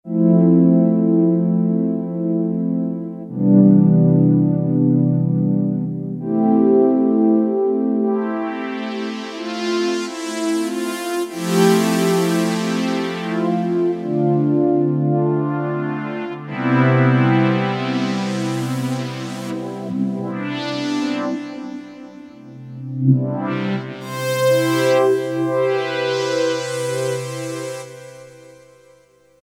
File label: Pad, Novation XioSynth 25